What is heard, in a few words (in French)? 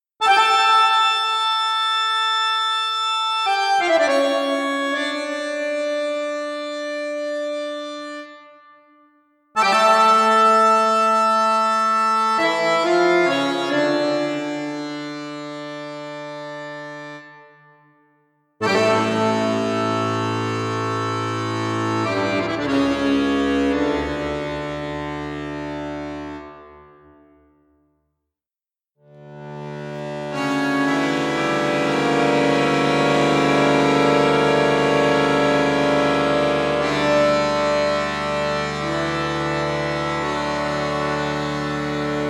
registre basson flute piccolo, accordeon; bugari 580 ars c